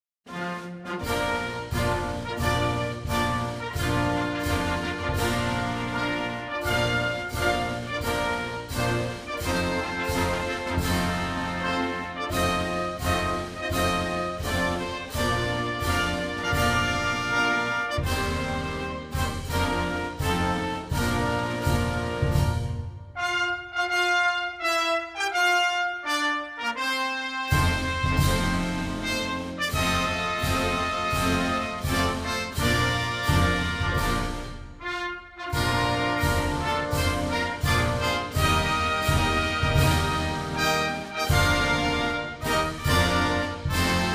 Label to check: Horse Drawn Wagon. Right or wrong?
Wrong